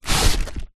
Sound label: cardboard box large slide